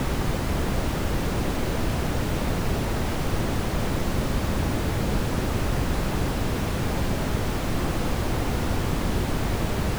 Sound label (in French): moteur; Gravity